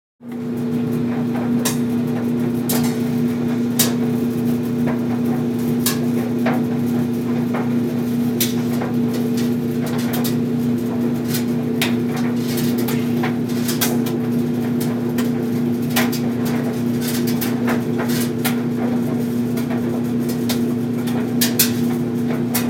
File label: Clothes Dryer Int Close Up